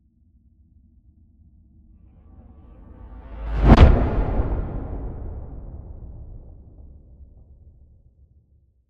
Swell To Impact Metallic; bottlerocket

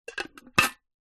foley metal money tin lid place on; zapsplat

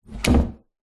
household door internal close medium

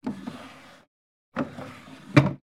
Wooden Drawers, Open, Close; lilesound

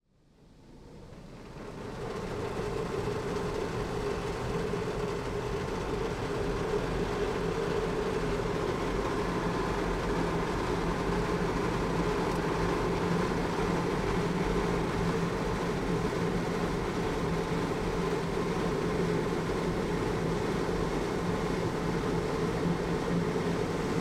industrial car wash automatic full cycle

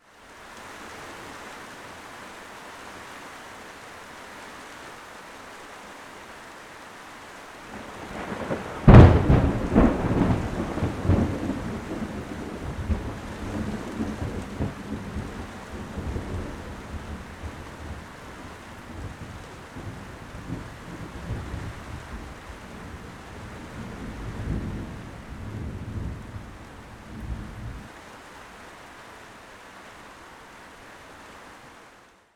nature thunder strike close rain

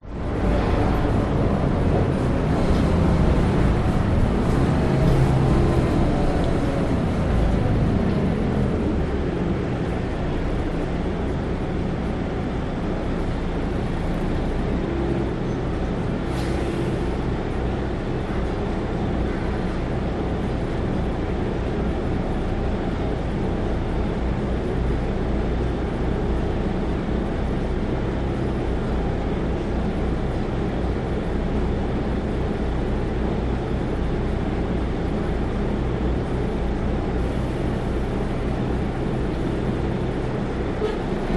vehicles bus ride internal stop lights away drive; sydney australia

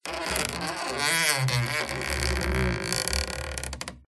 Stress Creak Squeak Groan; PMSFX

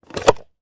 car cigarette ashtray open; transortation

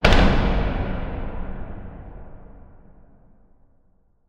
hit heavy metal hard reverb cinematic

